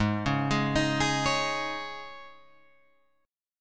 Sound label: G sharp Suspended 4th, Guitar, Open F, G#sus4 chord; G#sus4 arpeggiated; JGuitar